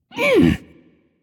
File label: grunt lift; smite; loki